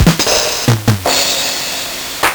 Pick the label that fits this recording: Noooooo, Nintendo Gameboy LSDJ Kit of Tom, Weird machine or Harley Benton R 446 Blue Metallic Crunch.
Nintendo Gameboy LSDJ Kit of Tom